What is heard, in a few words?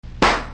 Ballons Poping